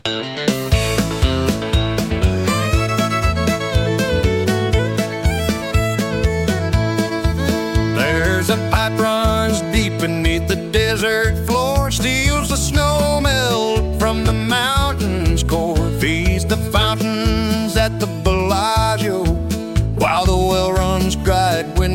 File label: DRY RIVER BED BLUES